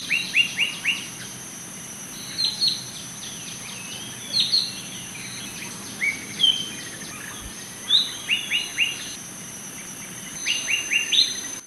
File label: call